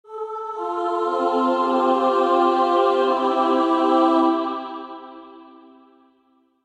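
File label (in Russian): zvuk hora, choir sound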